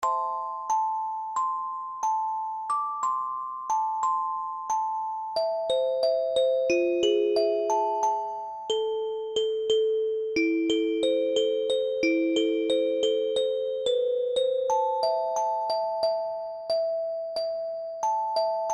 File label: music box, spooky kind of music; snowpanic